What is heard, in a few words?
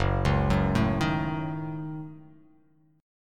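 Gb Minor 6th Gb, 8 string; arpeggiated; JGuitar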